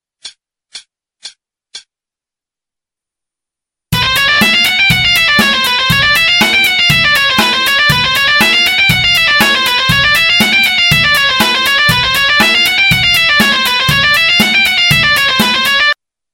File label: chromatic scale all 120